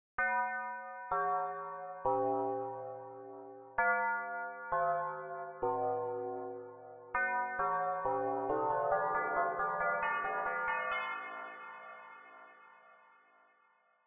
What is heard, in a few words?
Bell; Quidam